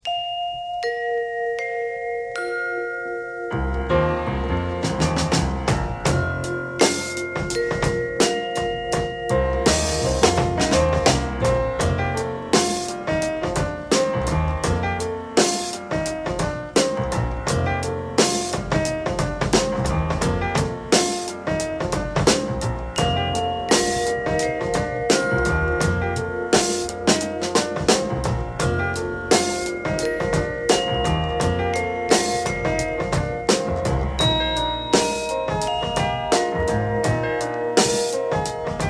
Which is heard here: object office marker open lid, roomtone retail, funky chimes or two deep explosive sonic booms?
funky chimes